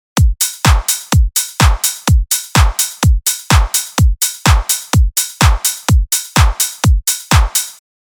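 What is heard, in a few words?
Kick Clap Hi-hat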